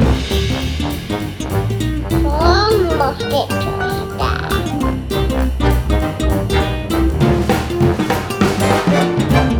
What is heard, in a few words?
swing time remix loop; uc12; calypso